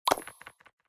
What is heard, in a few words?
button Click